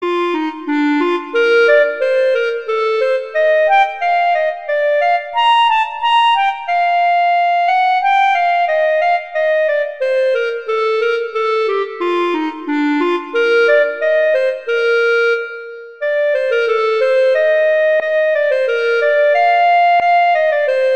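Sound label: Minuet, cello; Very Easy Collection; Mozart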